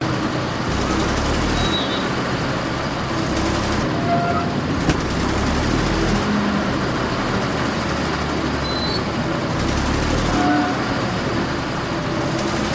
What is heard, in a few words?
TANK Small Unsteady Damaged; TRANSPORTATION